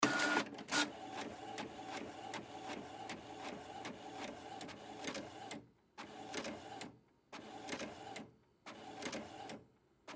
Printer Print Full Cycle, Hewlett Packard; Printers; Electronics; Office; Blastwave

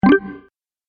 Positive Win Mini Bonus, Video Game Sound Effects

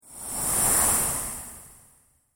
Evil Snake Hiss; Evil Sound Effects; fantasy sound effect

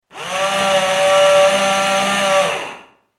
Electric Screwdriver; Hand Tool Sound Effects

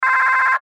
Radio Morse Code; Morse Code Weak Signal Erase; Morse Code Sound Effects; Blastwave; Warfare

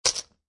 Poker Chips Dropping On Poker Chips; Poker, gambling, chip; clicking

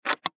Clipboard Plastic Clip Slow Shut; Office; Clipboards; Offices; Blastwave

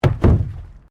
PLASTIC BARRICADE IMPACT

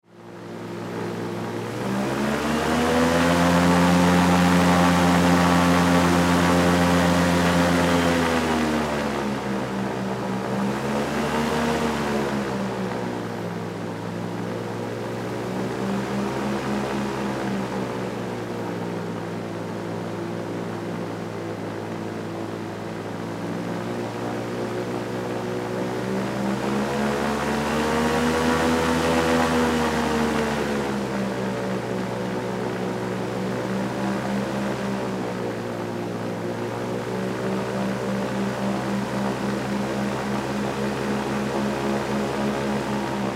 Air Boat Speed Up Slow Down; Transportation Watercraft; Boat Sound Effects; Blastwave

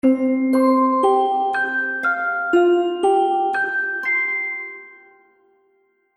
Happy Baby Game Music; Cartoon Music

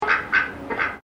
Bird Ducks Wood Ducks Quacking, Australian Birds; Animals

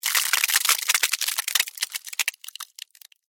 Ice Crack Long, Scifi; Science, Fiction; Sci Fi Sound Effects; BlastWave

